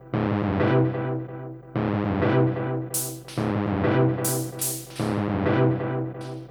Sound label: Crude Tape Loop, Tape delay; radiosonics